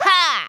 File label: kiai; MonsterHunter; Fight